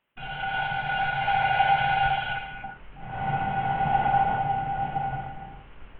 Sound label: breath; Ludum Dare 48